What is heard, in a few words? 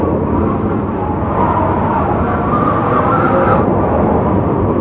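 wind heavy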